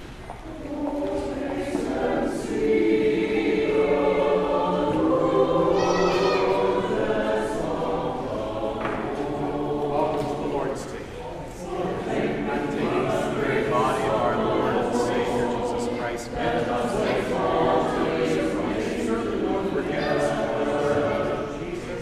Choral

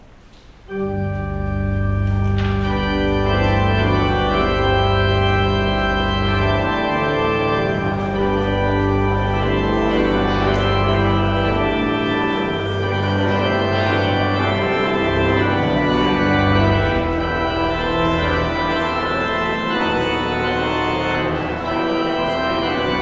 Postlude

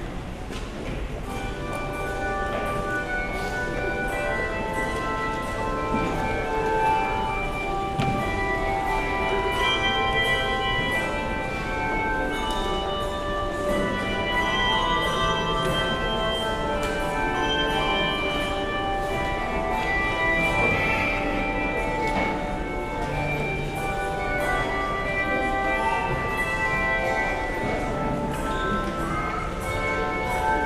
Handbells

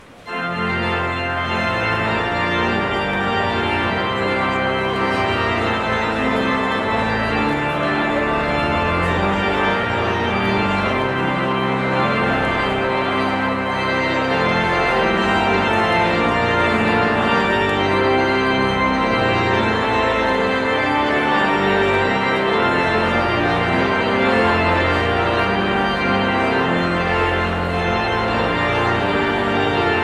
Postlude

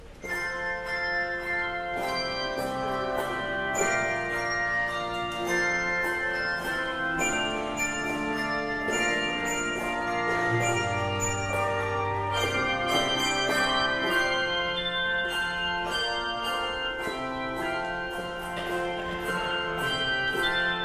Handbells; Come Thou Almighty King

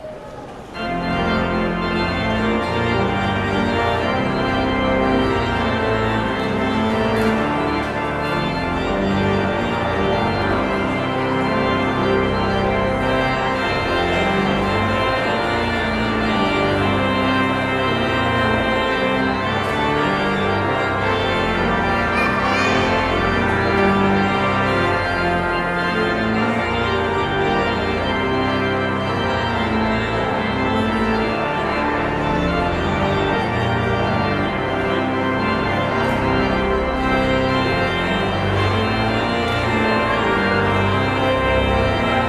Postlude

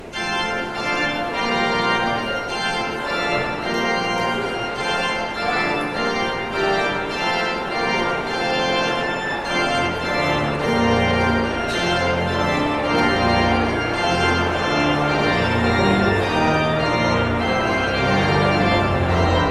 Postlude

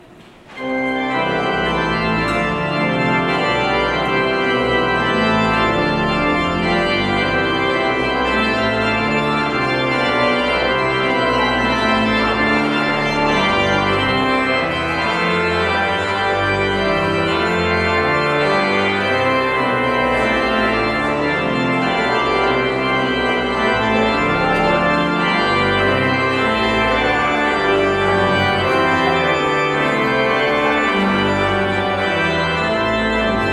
Postlude

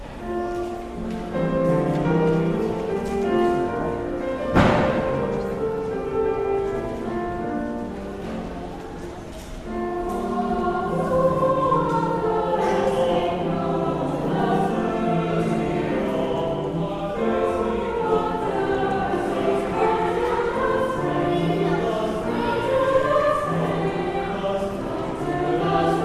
Choral

